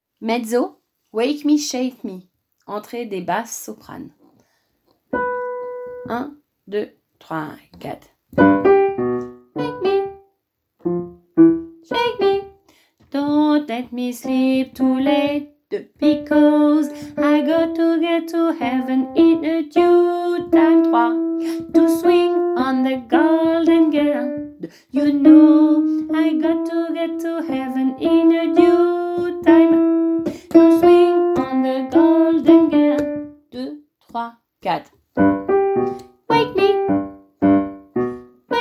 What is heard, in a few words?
Mezzo